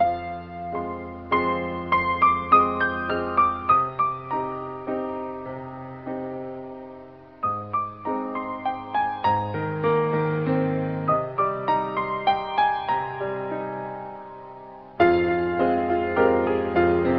piano, Loops Sad

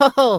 "ho ho", voice; ooh ahh; ho hoo woo

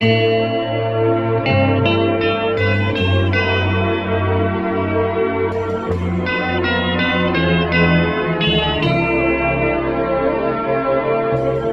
guitar, Loops, Sad

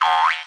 boing

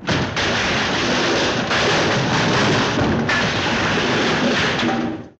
falling down; Crash Bang Boom

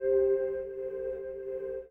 flute; Winds

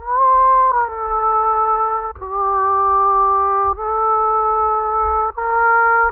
horns mouth trumpet, Sad; Loops

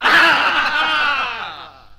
laughter group of men; ooh ahh

